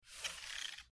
Short Squeaky Mouse

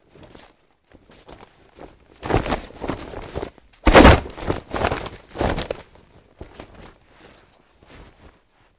Cloth Body Grab Pat, flesh

